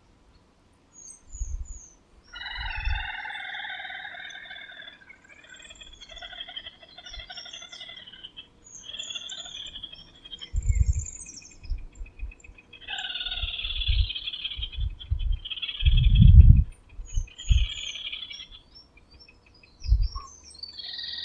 Canto do mergulhao pequeno

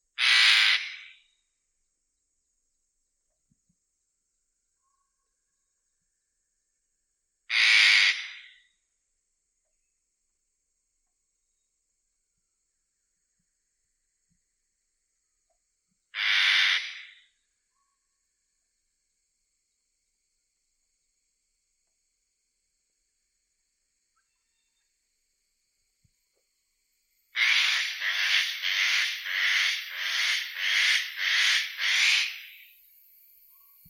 Canto do Papagaio ecletus

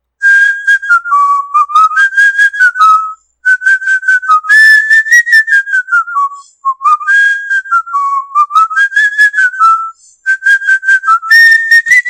Canto de Calopsita Marcha Soldado

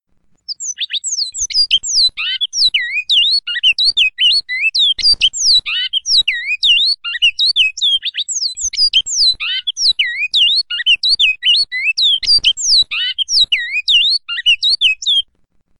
Canto Bicudo Pele Goiano Regional

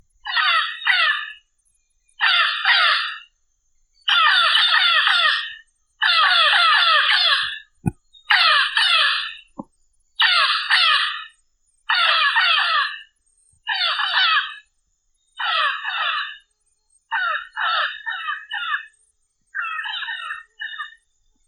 Canto do Papagaio Moleiro

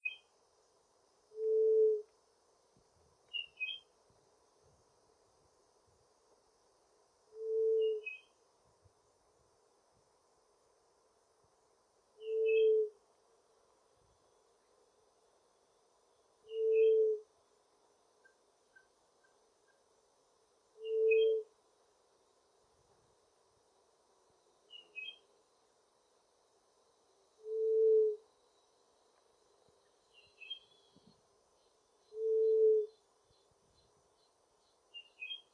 Canto da Juriti gemedeira

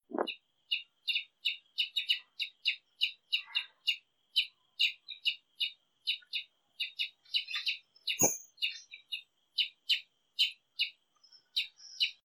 Canto do Tie Sangue

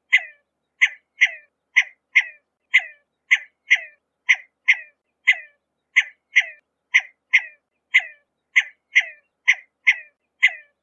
Canto do codorniz escamoso

